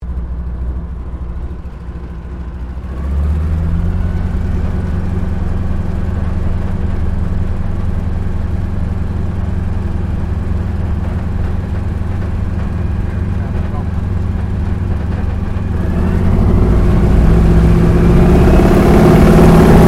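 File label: AIRCRAFT AT 6D HARVARD MK III TAKEOFF INTERIOR, Harvard Training Aircraft; Airplanes (Sound Effects:Vehicles)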